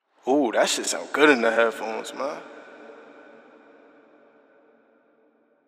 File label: drop